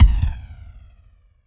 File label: giant run